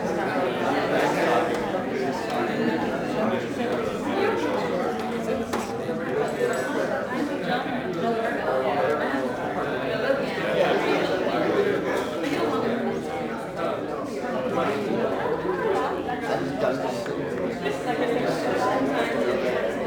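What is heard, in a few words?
Boxing Crowd Small Ambience Loop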